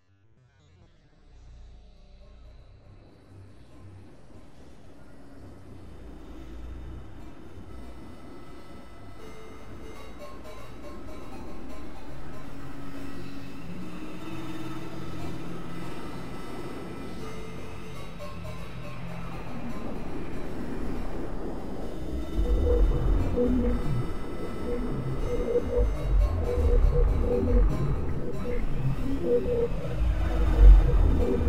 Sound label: noise